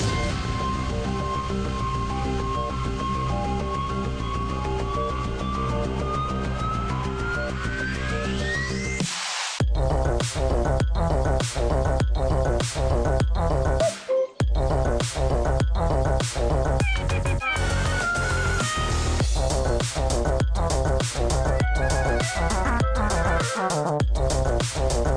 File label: Solo Raid Lobby